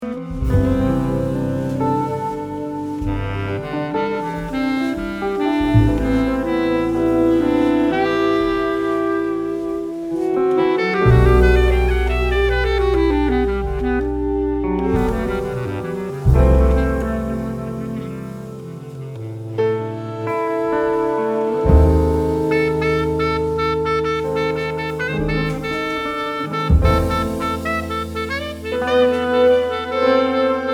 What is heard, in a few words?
Ballad